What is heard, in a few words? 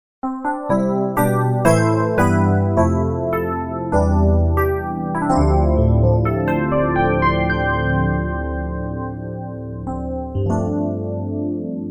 FM EP programs medley